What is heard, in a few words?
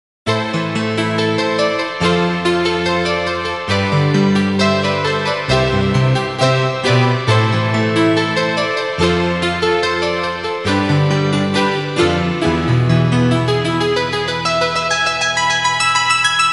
Piano Dreams